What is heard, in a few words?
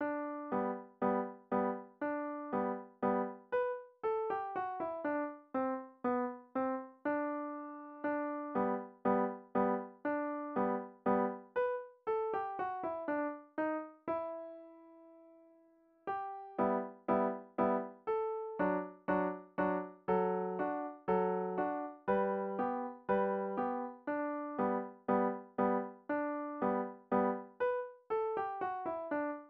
turkish march beethoven piano